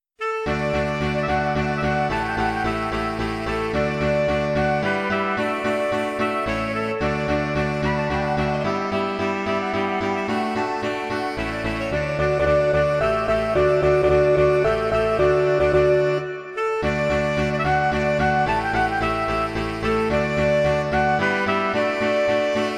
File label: Jazz Band